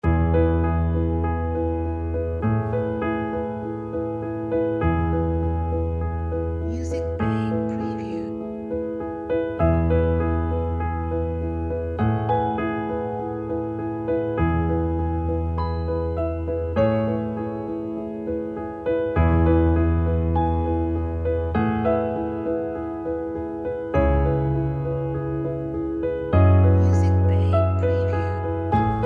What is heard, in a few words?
Beautiful Elegant Delicate Piano